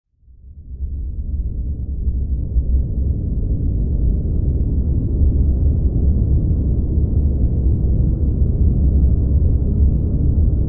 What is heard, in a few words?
Submarine sails underwater